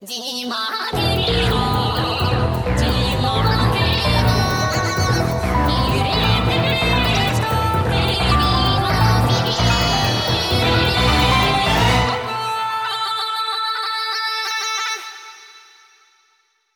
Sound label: BGM Field Start Eden; God Eater Resurrection